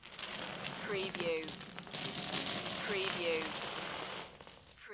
Dragging Chains

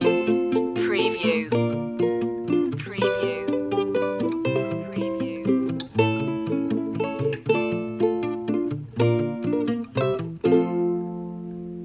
we wish you a merry christmas ukulele loop

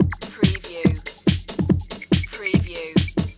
Techno-Trance Drum Loop 142 BPM

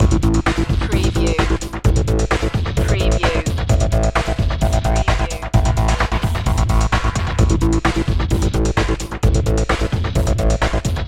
work in progress loop

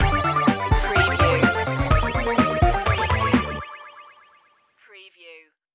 8-bit instincts stinger

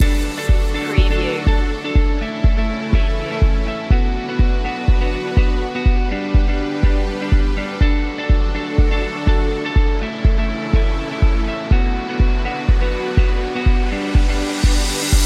background corp loop

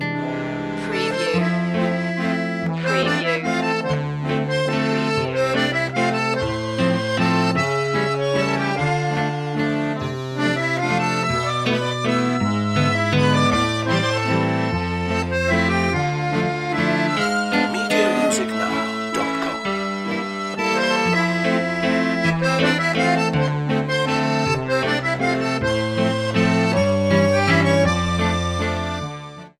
cafe musette

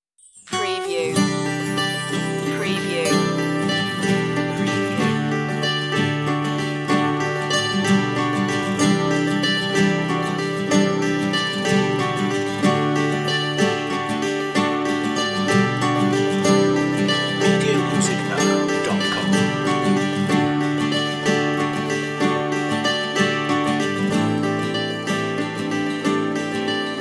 valentine short acoustic

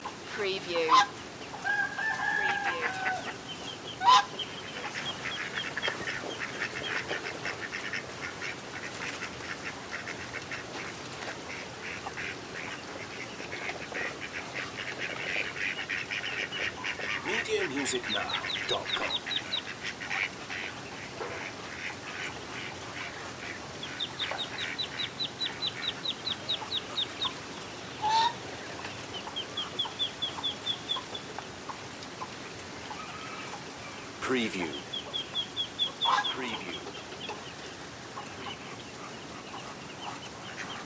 ducks chicken geese ambience; loop

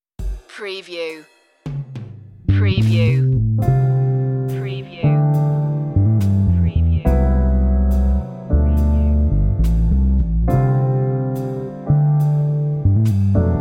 jazzy love underscore

